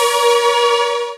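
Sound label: synthesizer Dance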